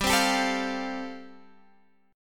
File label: G 7th Suspended 2nd Suspended 4th; G7sus2sus4 strummed